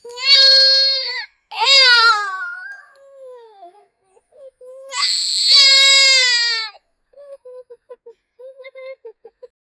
child crying

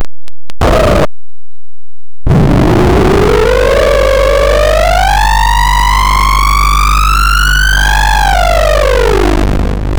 wrong sound; error

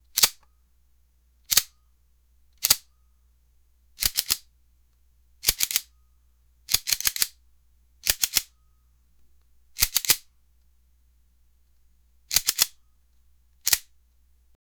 taser deployed

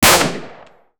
loud realistic firearm

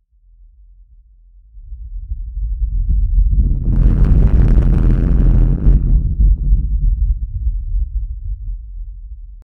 deep rumbling earthquake

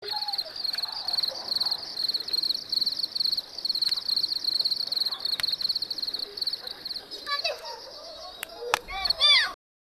kids playing cricket in c

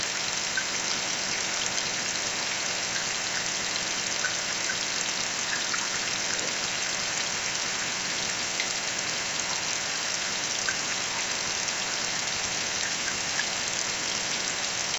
unusual sound of a light

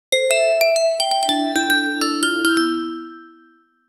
an uplifting short melody, bells

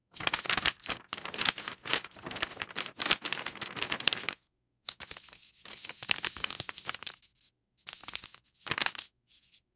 crisp sound of paper mono, counting

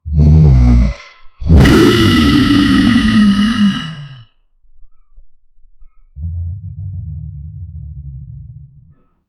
combine the sounds of a, roar

